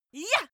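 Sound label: female attack yah, screams; schreie; menschen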